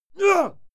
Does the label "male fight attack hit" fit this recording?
Yes